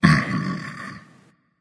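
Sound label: non verbal agree, voice; cernunnos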